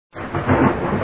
storm quest; citystorm

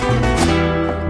Default Beep; salmahayek

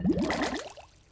win Aquarium Close

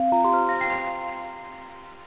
empty recycle bin; springriot

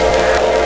weird

